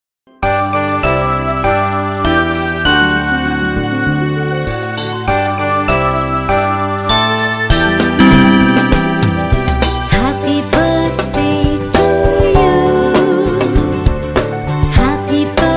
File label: happy bday to you female voice music